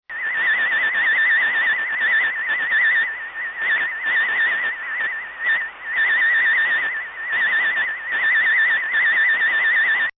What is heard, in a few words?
background unknown space signal